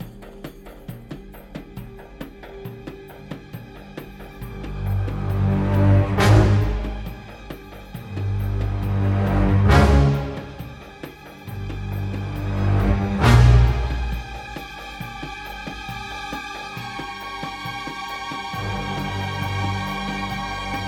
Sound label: Suspense Loop; Syria Castle